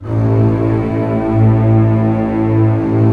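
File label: strings A0 FF; Sound On Sound - Peter Siedleczek's Orchestra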